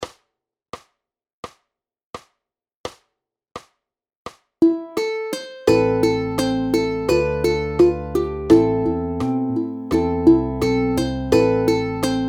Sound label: Uke Group